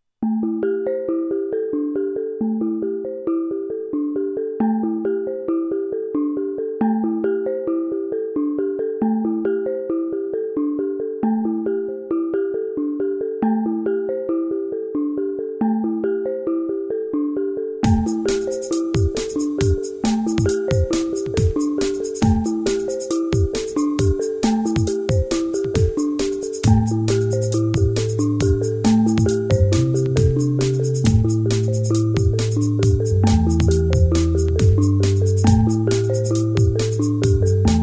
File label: Hawaii Noise